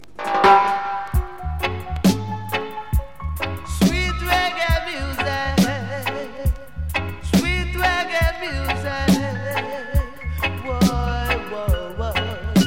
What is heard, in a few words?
Sweet Reggae Music